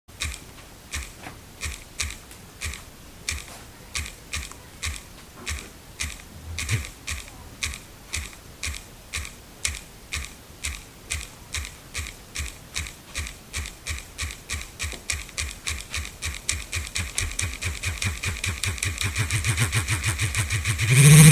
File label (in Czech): chuze vibra